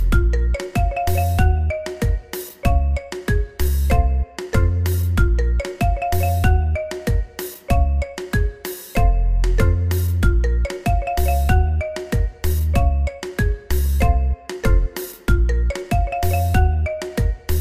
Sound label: Instrumental